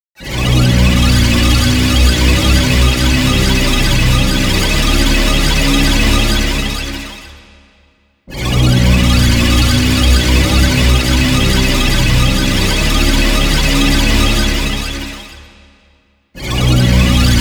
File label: Shock; Clock Tower 20th Anniversary Sound Collection